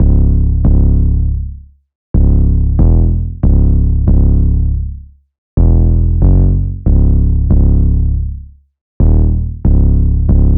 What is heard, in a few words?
808 loop 140 C#m; Slate Digital BASSHOLE Sample Pack